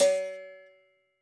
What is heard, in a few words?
Instrument